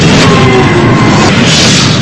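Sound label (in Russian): механизмы резкий шумный грубый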